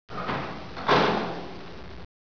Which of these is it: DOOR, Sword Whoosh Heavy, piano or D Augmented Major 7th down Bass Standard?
DOOR